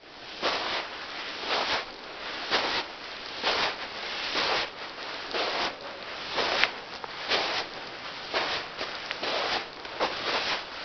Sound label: sandsteps